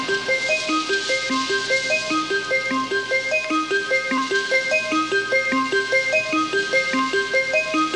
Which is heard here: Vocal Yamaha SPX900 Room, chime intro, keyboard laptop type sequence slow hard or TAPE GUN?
chime intro